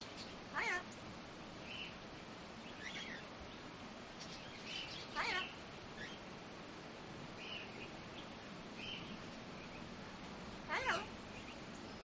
urban farm parrot chatter and background birds

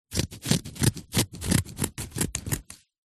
scissors cut fabric; zapsplat

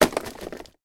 plastic pots fall over

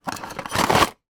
toy small place in card box slinky

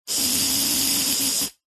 household aerosol deodorant spray strong long; zapsplat

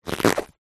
cartoon rubber latex pants squeak; zapsplat

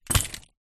car keys place down on car seat

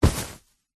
fabric bag soft full of clothes grab; zapsplat

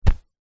clothing thick jacket punch sweetener; zapsplat

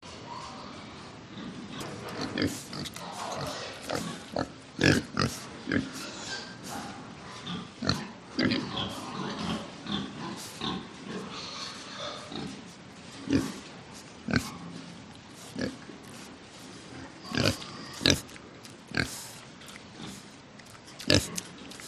Pig; audio hero

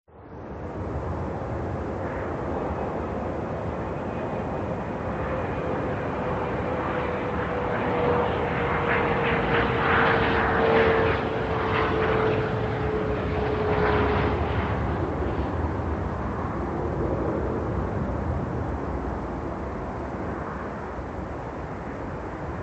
Airplane Jet; audio hero

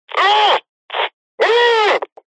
cb radio male voice screams speaker pov

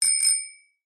bicycle bell ring; zapsplat

